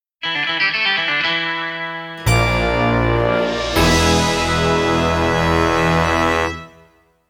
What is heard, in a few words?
Guitar Signature; Saber Rider and the Star Sheriffs; Saber's Guitar Signature 2